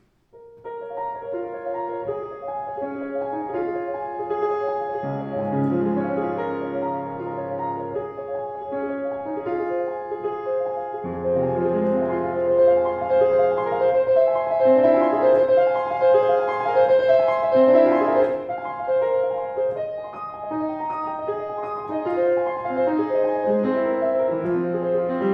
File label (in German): Yamaha YU10 Fruehl, Klavier; 121 yamaha yu10